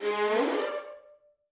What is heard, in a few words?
gliss